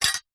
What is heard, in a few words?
tin small lid set down; foley; zapsplat